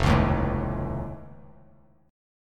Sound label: F Augmented 7th F, chord; strummed; F+7